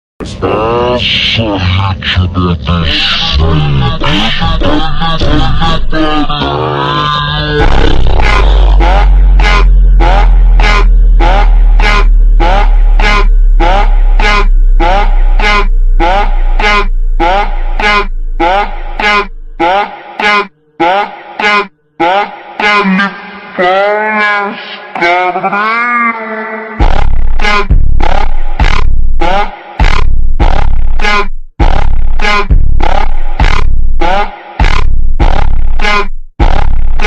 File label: automotivo super slowed reverb